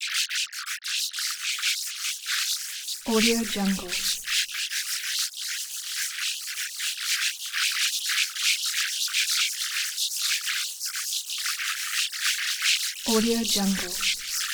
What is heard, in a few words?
modular ui micro gestures; soundmorph